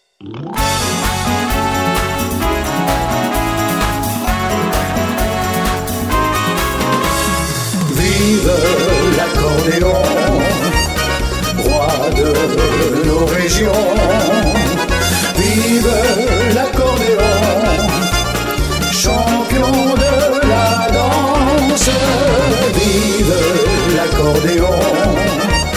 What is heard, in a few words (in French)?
accordeon